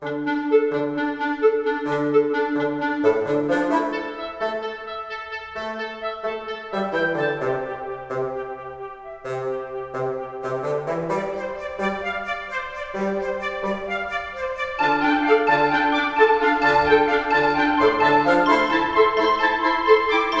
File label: woodwind breakdown fix yloph